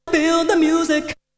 Vocal; ZERO G - Datafile DISC 2

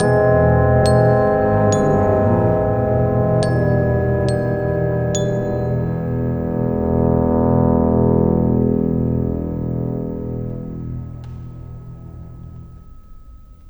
Cinematic Orchestra

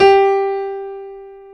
PIA PRO, Roland; Sound & Vision; Gigapack I CD 2; PIA GRAND 1; PIA Grand 3

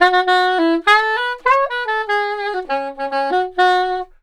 SAX, Balkanish Traditions

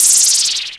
MS-20 FX up12; Digital Sound Factory

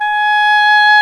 DOUBLE REEDS; OMI - Universe of Sounds

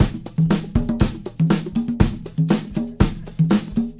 Combo, R&B Drums

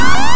SPACE ALAR; Big Beat Samples & More; AKAI; ALARM KIT

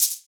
Shaker; Equipped Music; Loungin House

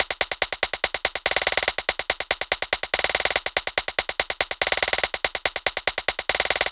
Percs Loops Groove

